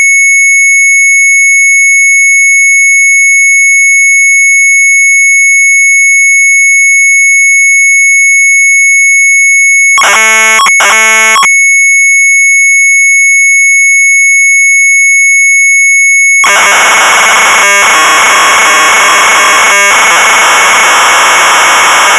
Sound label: MZ800 Tape; STROPER; sharp; retromelsarchive